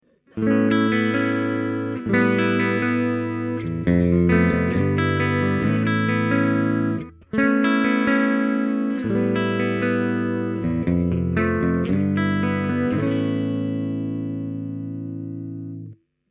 bridge, mp90

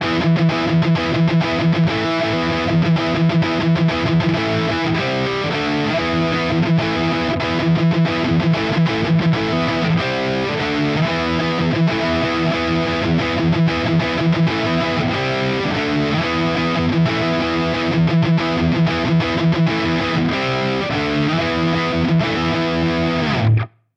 Rhytm, rebelliant neck

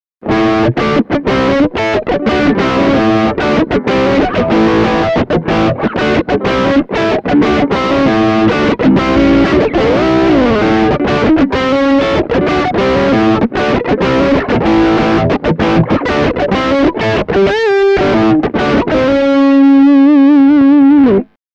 PAF Neck Drive

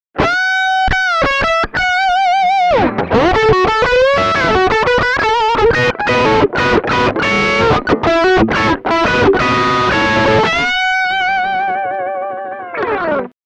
PAF Bridge Drive

